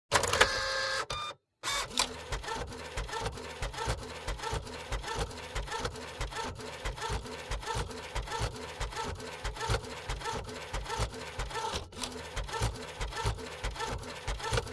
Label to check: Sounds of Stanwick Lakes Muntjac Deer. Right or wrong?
Wrong